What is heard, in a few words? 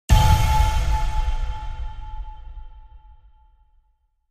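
Impact Metallic Clang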